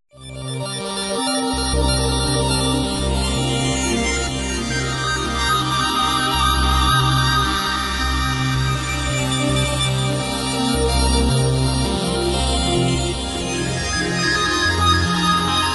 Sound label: ambient